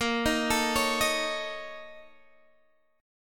A sharp Minor Major 7th Double Flat 5th, down, Guitar, Standard; A#mM7bb5 arpeggiated; JGuitar